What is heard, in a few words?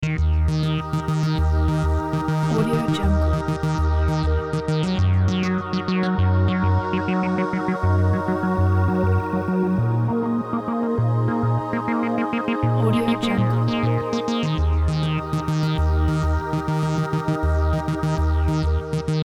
uplifting electronic ambient loop